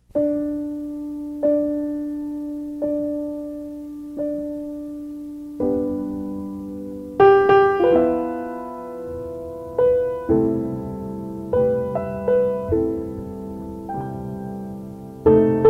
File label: Pulses, ballet music